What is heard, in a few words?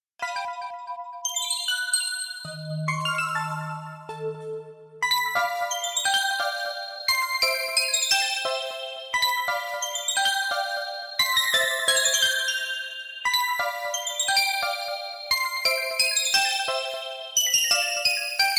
sideshow organ